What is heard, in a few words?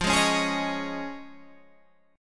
F Minor 7th Sharp 5th; strummed